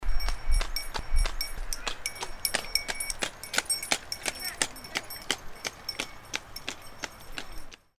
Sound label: horse carriage with bell